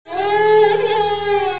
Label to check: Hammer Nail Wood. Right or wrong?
Wrong